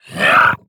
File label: Grunt Attack; Paladins; Dredge